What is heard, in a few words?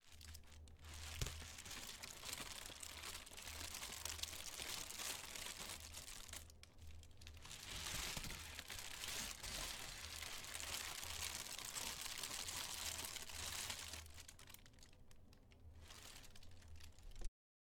Smoke